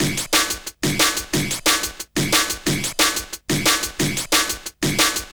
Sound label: distorto, drum & bass beats & breaks; Fluid Dynamics